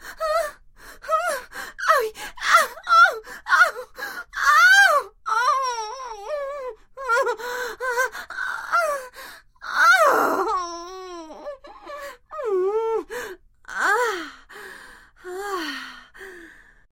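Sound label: sounds of female moans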